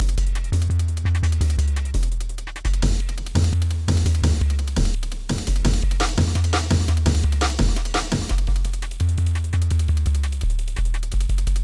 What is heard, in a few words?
subbass; dolphin city